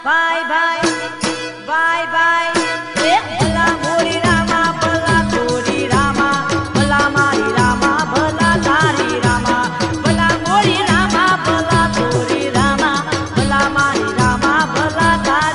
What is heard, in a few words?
gujarati ringtone